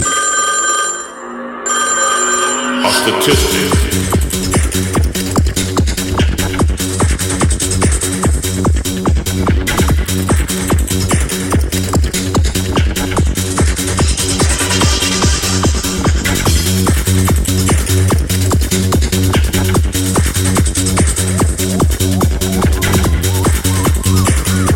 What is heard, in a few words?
Ring Ring Techno